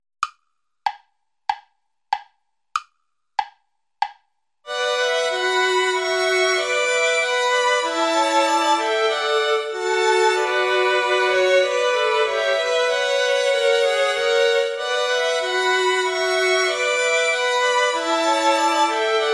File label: play along without cello; bach johann sebastian; ich steh deiner krippen hier